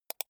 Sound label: computer mouse wheel click fast soft